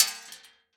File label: Shell Metal